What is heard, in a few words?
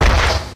giant pyro step; video game; source engine; tf; mvm